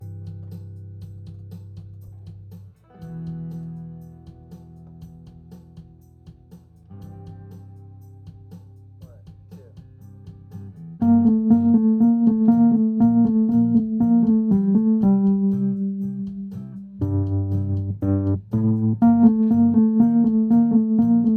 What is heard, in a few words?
Wurl